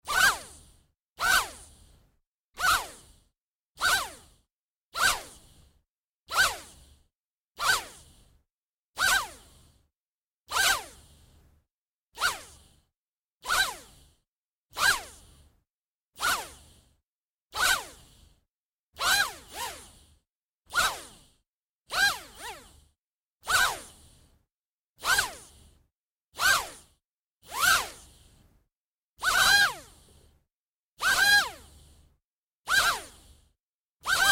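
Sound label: sounds of quadcopter drone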